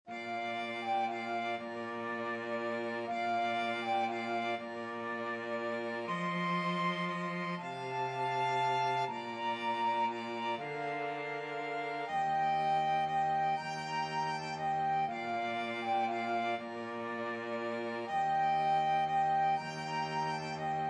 silent night, flute violin cello

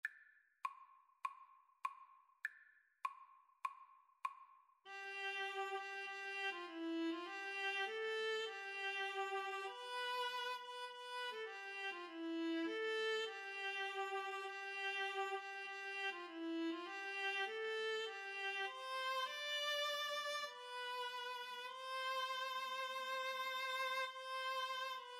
viola viola

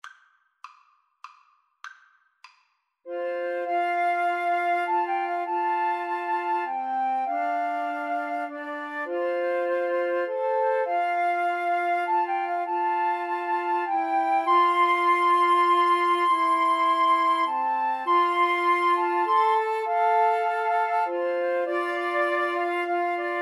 amazing grace, Flute Trio

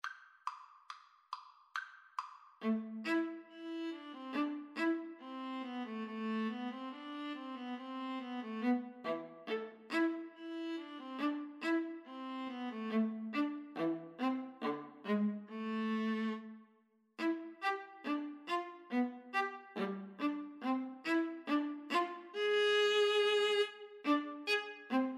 lully gavotte, Viola Cello Duet

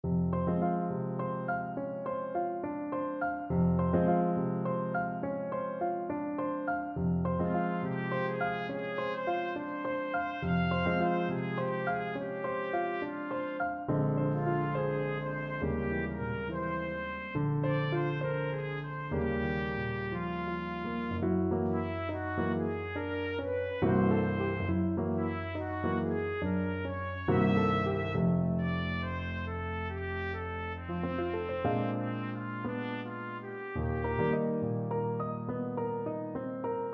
trumpet

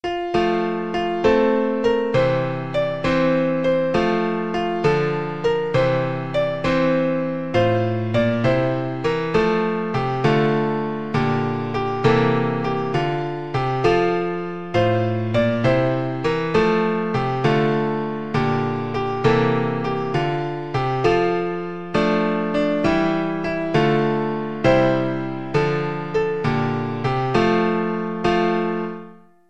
In Dulci J, piano

